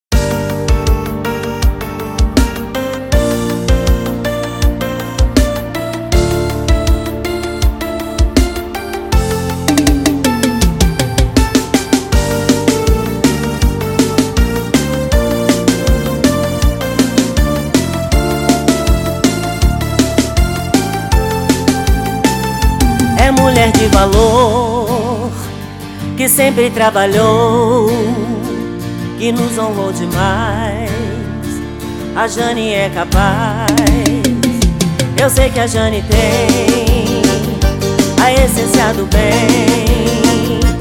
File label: Jingle